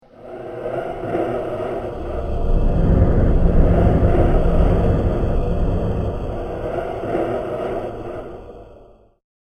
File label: Dark World Horror Scene; Scary Soundscape Sound Effects; scary videogames; sinister halloween